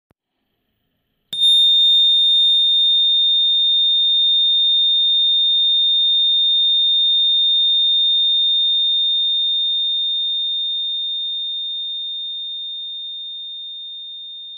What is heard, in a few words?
ping